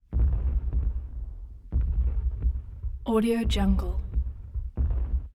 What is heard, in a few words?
explosions